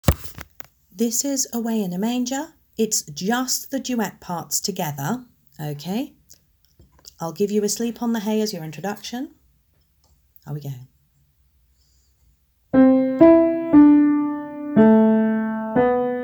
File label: away in a manger piano